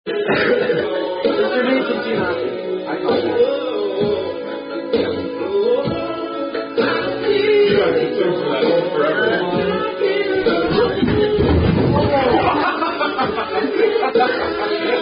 Drinking Game; Bunnies At Kates House

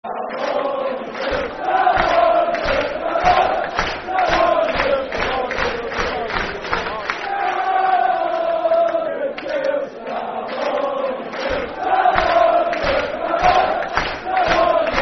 (Slow) Football Chant (Soccer Song); Come On You Gills; fanchants; Gillingham Gfc

